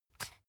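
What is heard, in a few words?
toothpaste open twist, Bathroom; haus-garten; badezimmer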